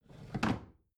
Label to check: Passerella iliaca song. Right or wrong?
Wrong